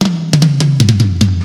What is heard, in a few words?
short reverb tom one shot g key; tuned drums g key